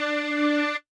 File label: Kawai K1 string sus; wavetables